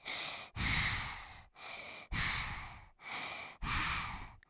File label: Sob breathing, Child